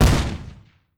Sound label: sfx defeat explosions; Animation Throwdown